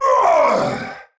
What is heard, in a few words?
Death, Battle; Warframe; Tau; Dax; Knight